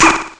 OUGH!; ST-XX Amiga Soundtracker Sample Packs

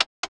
on off, Switch FX; Masterbits

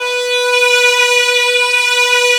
VIOLA; 3D Sound - 3D Keys VOL-1

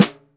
SD MP, JAZZ KIT

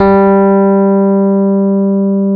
G 2, Electric Pianos; Wizoo

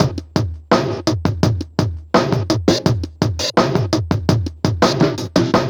Drum Loop, Drum Loops

